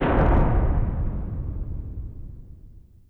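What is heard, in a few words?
Collision Exterior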